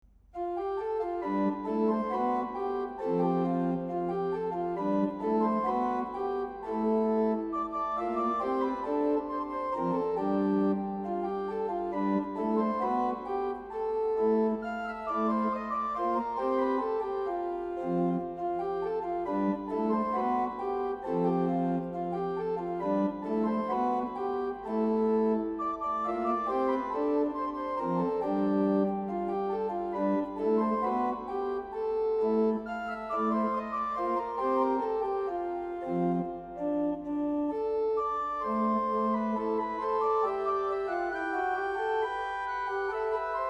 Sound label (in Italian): per organo; bell